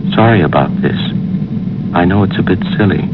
Color Blue Exclamation

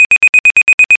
beep; DINAMATION; FLAG

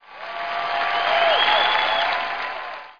Applause; Free Hearts

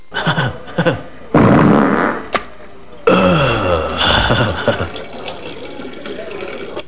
fart; The SoundBlaster Multi Media Experience vol 4

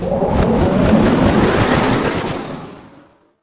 Tube Suck; Let's Discover New York

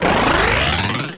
hoop in

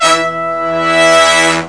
brass upstairs, techno stabs; Terra Sound Library

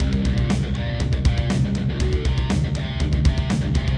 Metal, hold music; Gizmo Project 4.0.4

